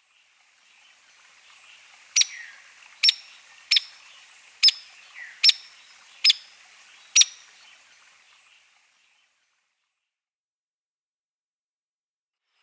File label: Hairy Woodpecker (Eastern)